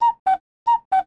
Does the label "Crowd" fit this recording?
No